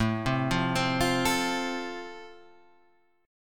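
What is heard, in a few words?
A Minor Sharp 5th, Guitar Standard, chord strum; Am#5 arpeggiated; JGuitar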